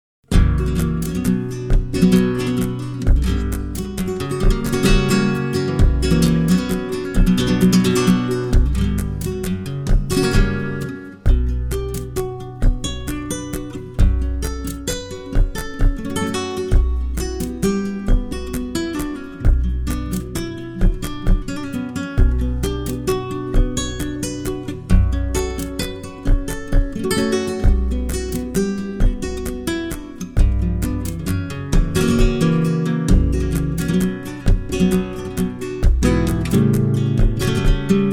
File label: Fandango Guitar, flamenco